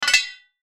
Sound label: chime metal, Chime Metal Clank; Chime Metal Clank Production Element Imaging Element Accent Transition; Multimedia; Blastwave